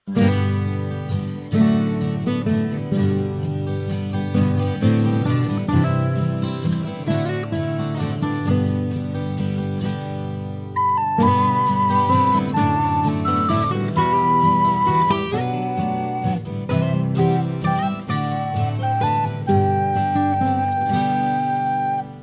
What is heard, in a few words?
waltz